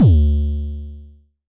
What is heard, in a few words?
Dist Kicks D2, Vermona Kick Lancet, Distortion Kicks; Drums; LookIMadeAThing Kicks